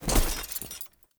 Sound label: Heavy Grunt Foley Body Fall; Sinecure; Enemy